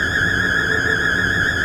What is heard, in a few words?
Sci-fi UFO Loop; SFX Kit; Sci-Fi Loops